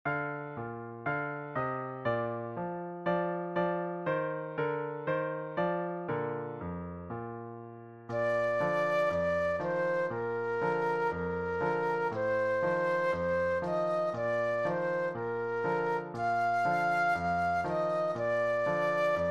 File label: Flute Solo